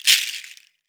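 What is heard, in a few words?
Ankle Bells; Roland SPD-S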